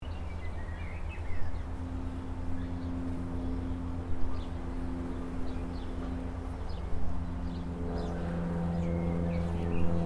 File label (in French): nature bord route echos monde clos calme